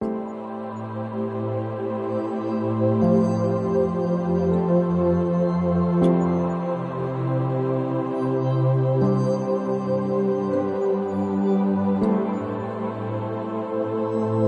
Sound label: Background light meditative music for short relaxing